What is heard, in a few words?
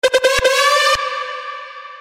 HORN SHORT